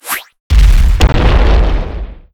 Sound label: Kick Boulder; Nicktoons Nitro; arcade